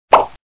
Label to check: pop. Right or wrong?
Right